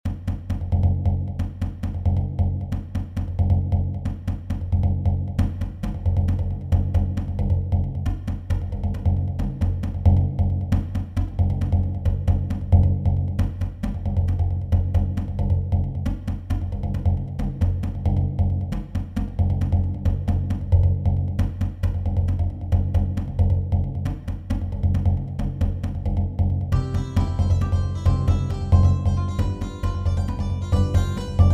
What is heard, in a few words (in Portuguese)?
Estudo Ritmico para Jongo